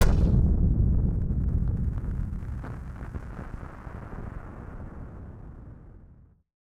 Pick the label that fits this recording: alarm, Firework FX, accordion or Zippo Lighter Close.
Firework FX